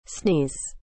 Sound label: sneeze